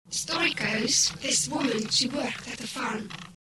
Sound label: chorus